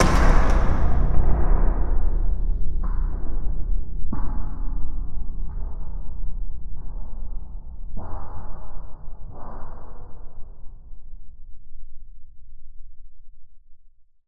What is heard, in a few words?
Bash, Cinematic Metal Impacts